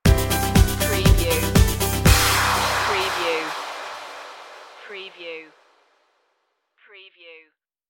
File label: rise above stinger